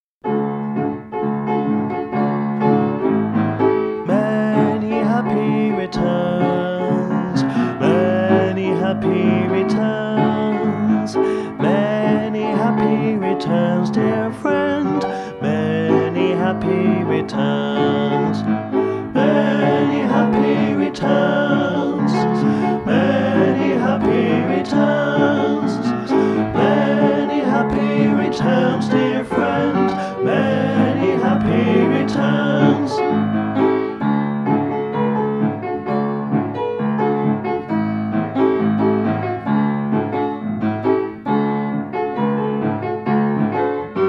Birthday music

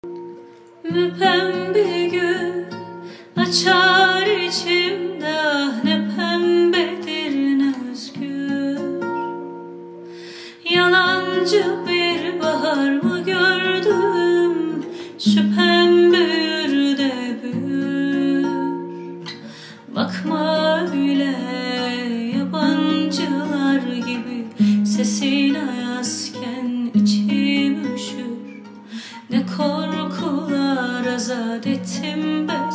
Guitar

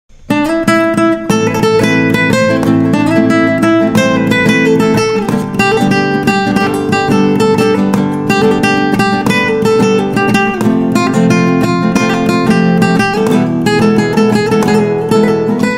Guitar

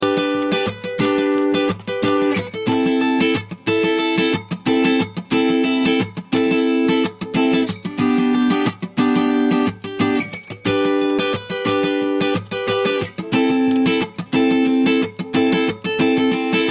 Light Ringtone

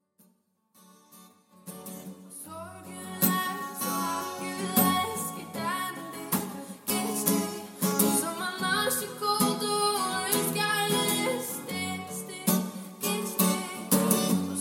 Guitar

